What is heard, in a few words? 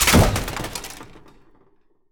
Plastic Heavy